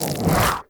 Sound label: Venom Cast; Warframe; Saryn